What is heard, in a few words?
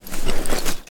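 inventory backpack off; STALKER; IWP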